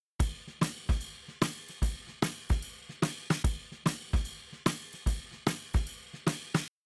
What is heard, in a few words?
Drums, shuffle drums; WDR2